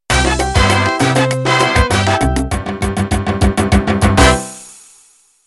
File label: Salsa End